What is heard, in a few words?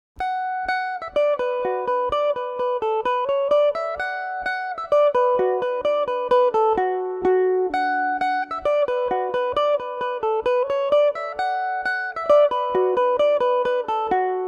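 polka B minor revelation, irish mandolin; The Goat’s Polka (standard version); Revelation Jaguar electric mandolin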